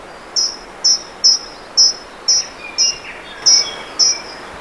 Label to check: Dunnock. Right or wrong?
Right